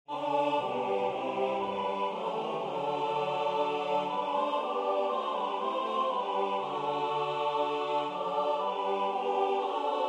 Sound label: Hymne. Tutti; O Come Emmanuel